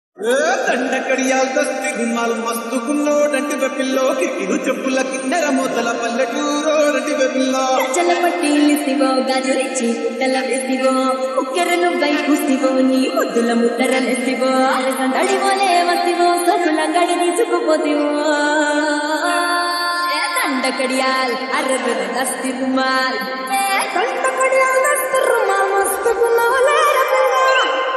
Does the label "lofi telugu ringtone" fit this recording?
Yes